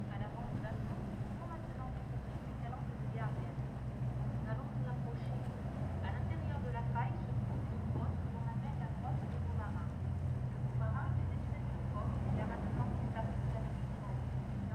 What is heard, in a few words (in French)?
bruit bateau voie; scandola 2018